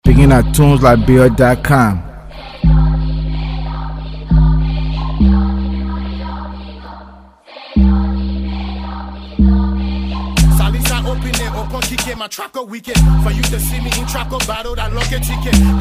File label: Raw Trap Exercise; TrapCo